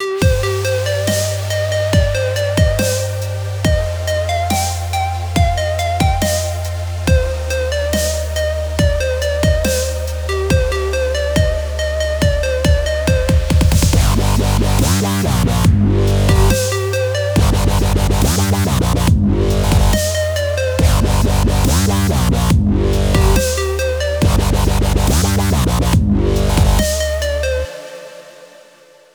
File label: Itsy Bitsy Spider Dubstep